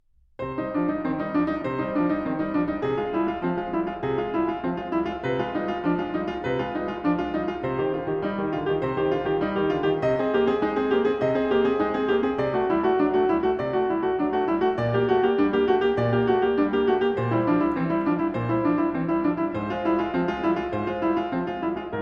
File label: Prelude No. 2 in C minor, Well-Tempered Clavier; BWV 847; Bach; Book 1